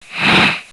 Storm Elemental Hurt Sound

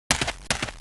Wood Elf Move Sound; Heroes3

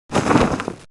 First Aid Tent Hurt Sound; War Machine

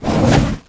Basilisk Attack Sound; Greater Basilisk

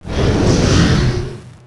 Hydra Attack Sound; Heroes3

